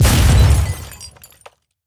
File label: Building Part Smashed; Rampage (Arcade) (gamerip) (2018)